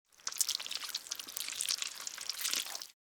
Worm slithering movement short; slug and worm

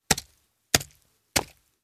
Knife or dagger slide and stab

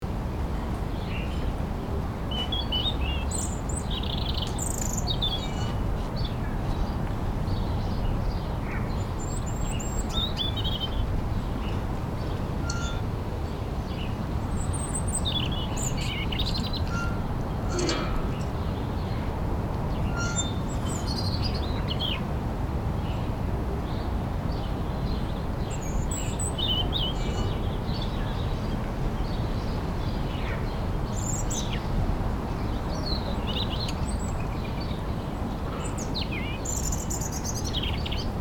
Desert village ambience light activity loop

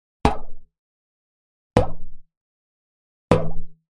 Cartoon accent impact boing

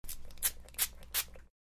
Air freshener sprays

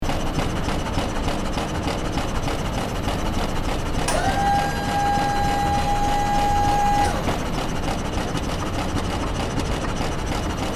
Industrial ambience factory hydro pump loop

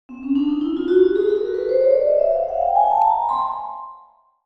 Old vintage cartoon flashback

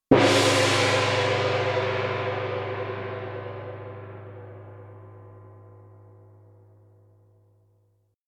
Single gong hit

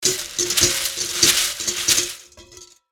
Steel whisk stirring crunchy mix; household

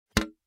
Single water drop empty plastic bucket

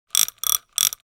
Socket wrench clicking turning

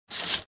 Sheer curtain slide soft swish; blinds

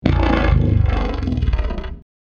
Electric guitar bass drone effect

